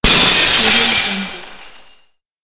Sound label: broken window